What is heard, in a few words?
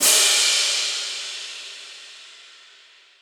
Crash, Cymbal; One Shots; Drums; Ironman; Ableton Live 12 Lite; Core Library